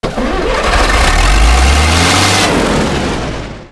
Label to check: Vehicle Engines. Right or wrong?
Right